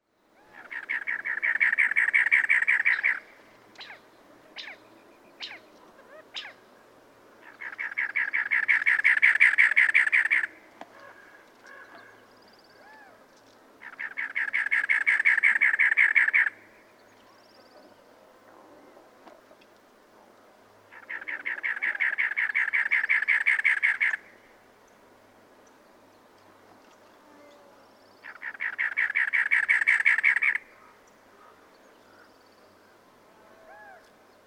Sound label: Cactus Wren